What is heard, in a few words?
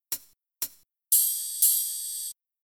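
Rhythm RnB hi-hat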